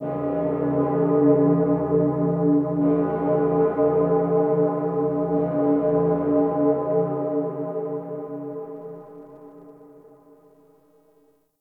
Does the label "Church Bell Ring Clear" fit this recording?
Yes